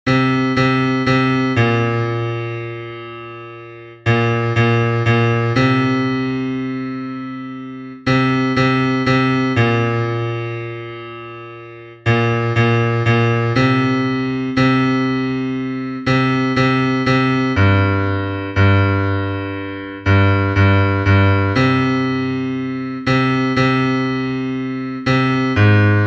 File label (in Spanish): bajo, himnos en piano; cantos del camino